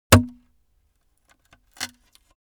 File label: weapons sword hit wood pullout